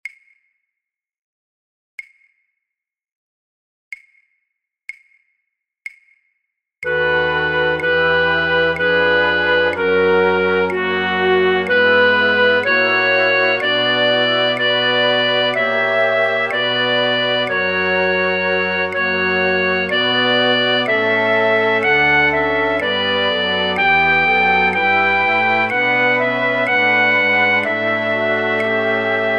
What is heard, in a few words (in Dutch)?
fluitorkest, Fluit 4B; voor oefentracks; Matthaus Passion